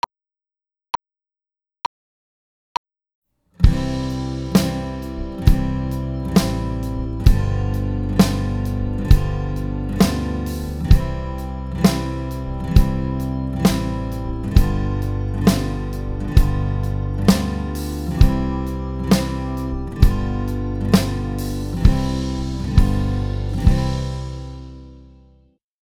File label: Strum Pattern